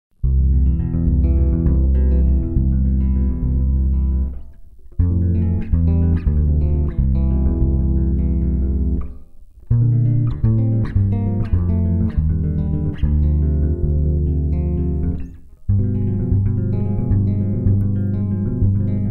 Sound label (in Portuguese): samba curto